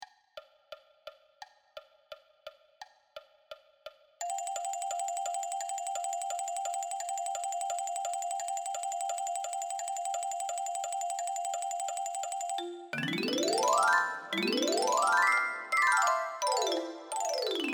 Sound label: Marimba